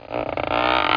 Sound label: SQUEEZE, dogz